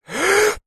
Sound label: human male breathe in inhale holding breath; zapsplat